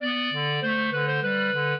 clarinet; Mozart

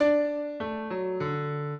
piano; Mozart

